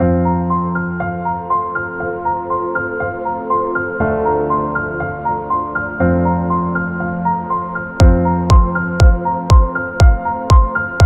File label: Corporate Piano; corporate interview